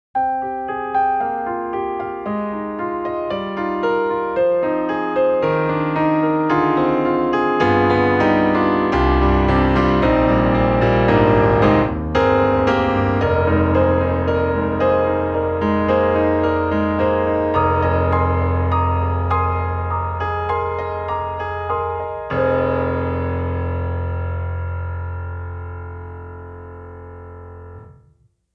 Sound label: Evolution Rosewood Grand Historic Grand; Orange Tree Samples